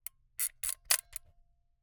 Camera, Aimex SP500, point n shoot, plastic, wind film, ping; Eiravaein Works; Vaeyan IV